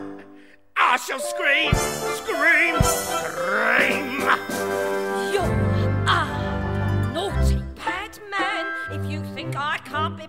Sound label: I Shall Scream